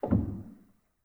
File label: rel, Grand